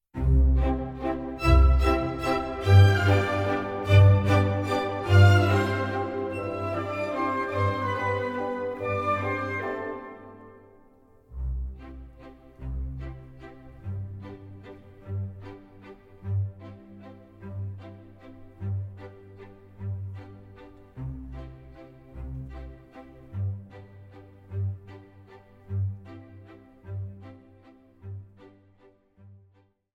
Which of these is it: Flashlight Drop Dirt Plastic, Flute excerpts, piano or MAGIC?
piano